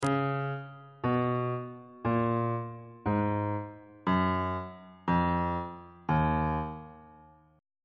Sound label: e flat aeolian mode descending on bass clef